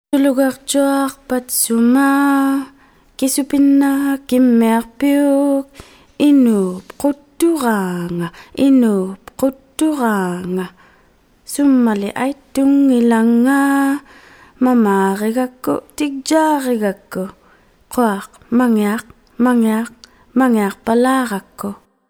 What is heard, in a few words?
Niaquq Tui Siiqquq tune of London Bridge, inuktut